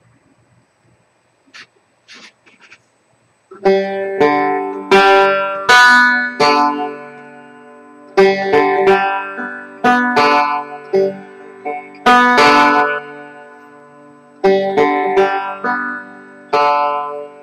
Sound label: fender, banjo